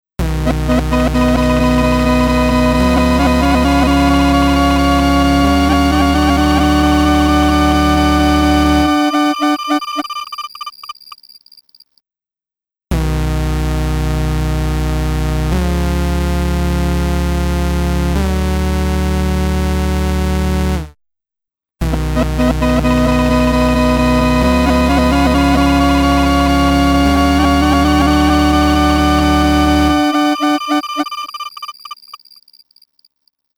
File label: Synth; Preset: Synth Surprise